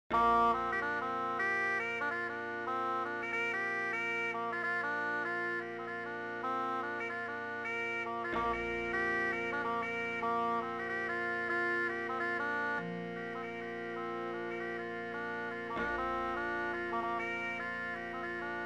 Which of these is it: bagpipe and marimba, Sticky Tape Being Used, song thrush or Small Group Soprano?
bagpipe and marimba